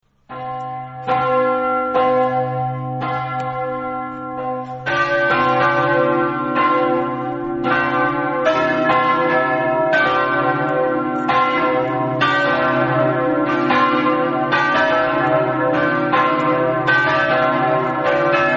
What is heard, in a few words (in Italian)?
campane; muris